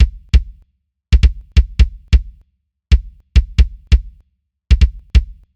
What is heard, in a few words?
TG-D70 Kick Inside